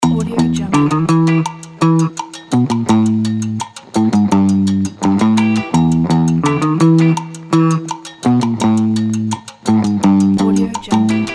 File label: cool retro funky indie rock